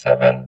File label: VOX VOX, Electro Vox